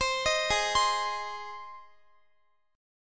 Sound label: G sharp Major, Guitar; arpeggiated; x,x,22,20,20,19; 4E,4A,5D,5G,5C,6F